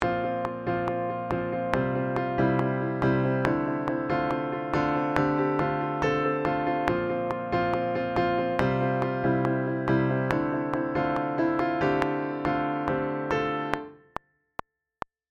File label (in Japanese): ベースとフレーズ反行イントロ